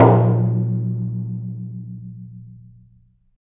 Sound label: Af. Hand Drm, Ambient